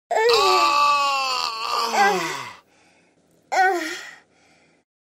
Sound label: jumpscare sound; fnia